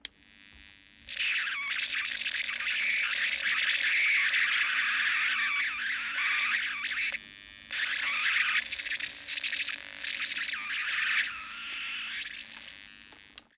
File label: Radio Signal, notifications; Vestel Venus